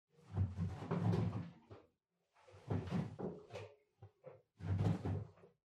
Washer Front Load Far Clothes Rumage

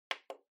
Portable Stereo Button

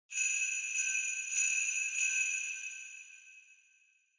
Sleigh Bells Reverb Shake Gentle Short

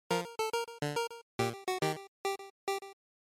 Miss; GB

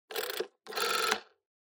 Rotary Phone Hardware Dial

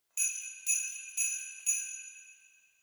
Sleigh Bells Knock Gentle Short

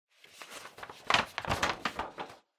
Calendar Flip

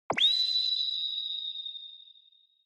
Flash Low Short; Onoma; オノマトペ 光る; 低 短

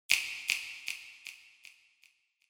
Finger Snap Foley Delay

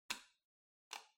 Light Switch Selector Button Hall Gentle

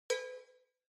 Cowbell Low Reverb

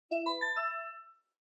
News Alert Far

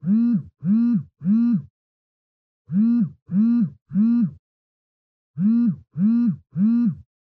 Cell Phone Vibration (Blanket)

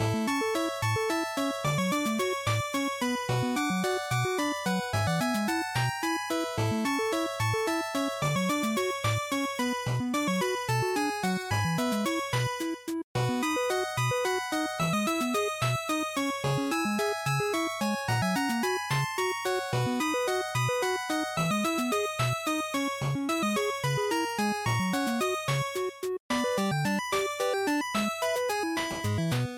NES Action Ending Loop73

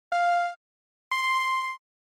SNES Racing Countdown

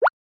Motion Pop

